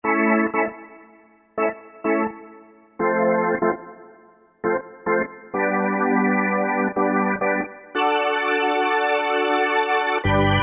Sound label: D-50 Organ; Roland JV-2080